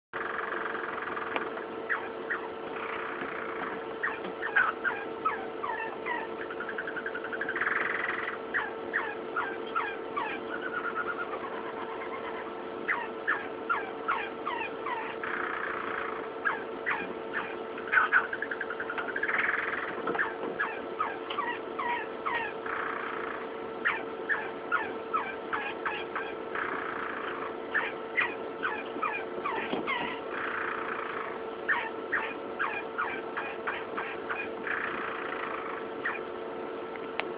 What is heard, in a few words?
canary